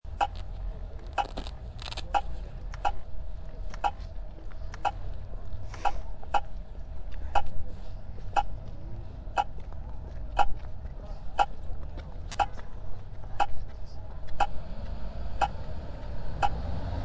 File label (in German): auffindungssignal, ampeln; verkehr